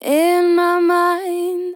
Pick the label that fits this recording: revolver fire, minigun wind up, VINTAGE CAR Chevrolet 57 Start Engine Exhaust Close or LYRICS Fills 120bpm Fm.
LYRICS Fills 120bpm Fm